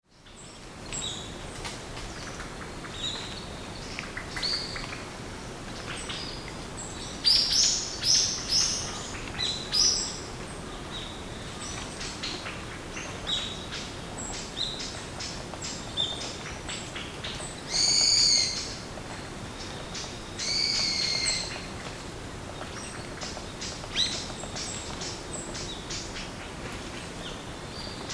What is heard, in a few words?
old growth forest